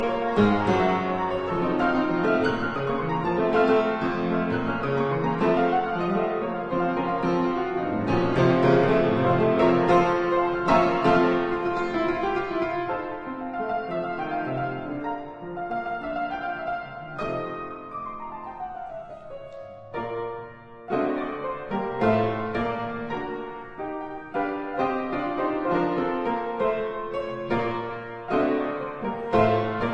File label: Keyboard Grande Sonata in G minor, Allegro agitato vivace assai; Op 39